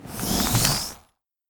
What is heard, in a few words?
arrow load